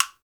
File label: Afro Gourd Guiro; Roland LCDP14 Africa VOL-2; Afro Toys